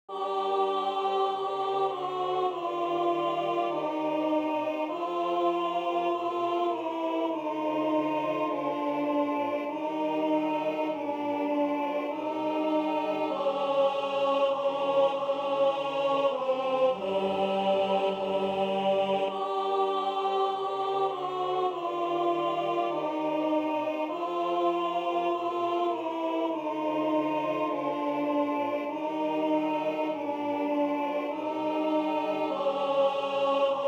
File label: alto; Ignudi